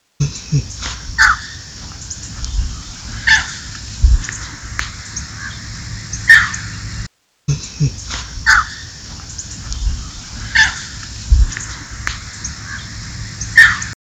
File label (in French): Bihoreau violacé crabier Nyctanassa violacea Son